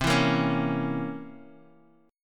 C 7th Flat 9th, Guitar, Half Step Down

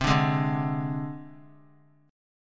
C Suspended 2nd Sharp 5th, down, Guitar; Csus2#5 strummed; JGuitar

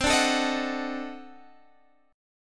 D 7th Flat 9th C, chord

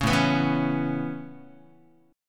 A-Major Add 9th Guitar, Aadd9 chord; Aadd9 strummed; JGuitar

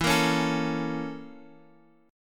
Bb Minor Major 7th Flat 5th, E

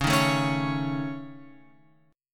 C sharp Suspended 2nd Sharp 5th, chord; C#sus2#5; {9 6 7 6 X 9} strummed

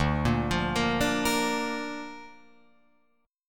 D Minor Sharp 5th D down Guitar Open F; Dm#5 arpeggiated; JGuitar

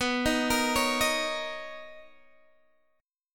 B Major 9th B Guitar Open D

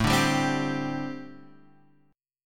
D 7th Suspended 4th, chord

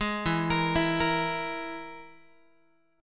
E Major Flat 5th, Guitar; arpeggiated; JGuitar

